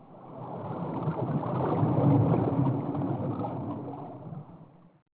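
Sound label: water ambience; Feeding Frenzy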